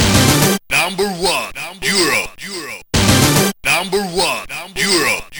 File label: beatbox; Pinball Dreams